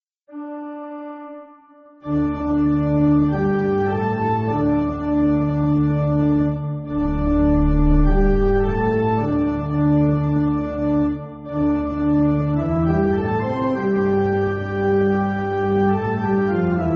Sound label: Pipe, Pipe Organ, Music Backing